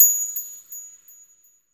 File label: Lucky Coin